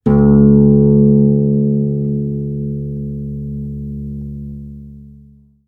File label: Guitar Note; Easter Egg; serious sam siberian mayhem 2022 pc gamerip